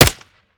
sup; viper

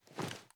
reload empty cloth; reloads; gmod; iw8

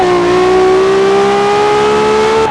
third, vehicles; gmod; tdmcars; fer f12

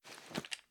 reload empty down; reloads; gmod

